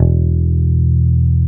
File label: easy rider, Bass